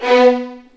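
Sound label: B3 violins; The Ultimate String Collection; East West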